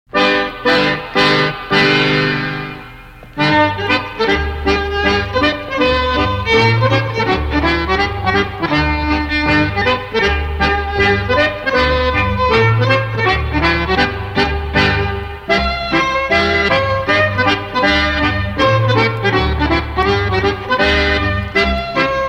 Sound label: danish schottische (instrumental)